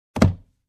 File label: Carton Boxes fall down